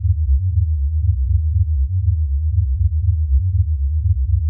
alien sound effect

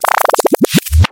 backspin

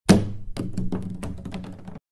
pvc pipe falling

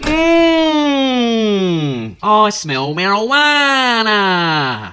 mhmmmmmmmmmmmmmmmm

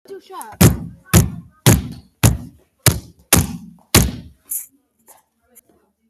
ahahahhahaha

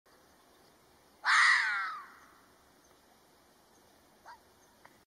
fox barking

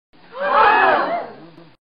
audience ooh

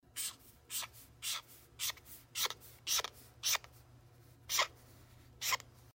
marker noise

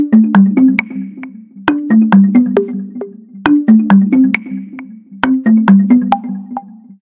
ringtone

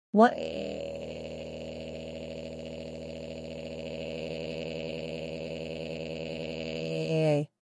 waaaaaaaaaaaaaaaaaaaaaa

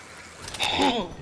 strange sound

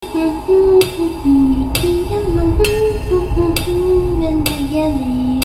singing

